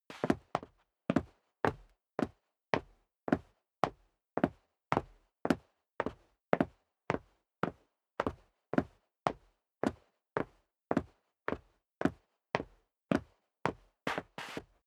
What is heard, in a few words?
step sneaker concrete upstairs sequence; schritte